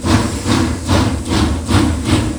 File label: train loop; Soldier Of Fortune II Double Helix; XBOX; ambience